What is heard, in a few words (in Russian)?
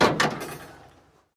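негромкое падение одиночного металлического предмета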